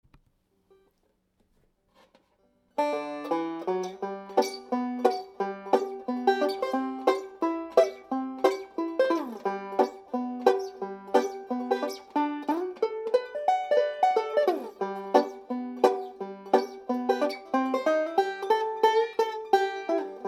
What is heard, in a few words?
banjo; Hatfield custom